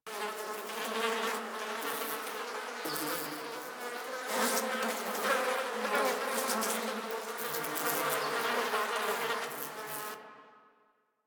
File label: Ambient Fly Loop, Village Exterior; Warframe; Ambience; Eidolon Plains; Ostron Village